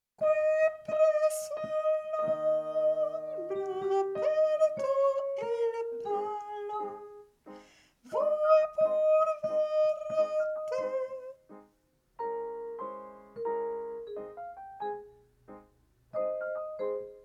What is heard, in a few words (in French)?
Sop 1 lent